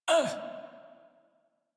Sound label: Pain, Barks, Battle; Warframe; Baro Defense